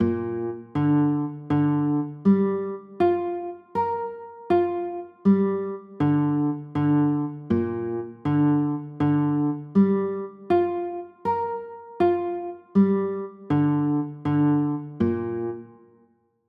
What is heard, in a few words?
b flat 9, chords